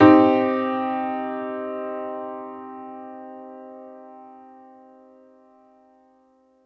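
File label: Piano Upright minor med; TrashLibrary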